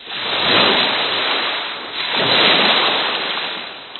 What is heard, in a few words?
Waves; Buggy; PS1